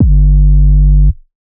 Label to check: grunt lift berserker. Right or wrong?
Wrong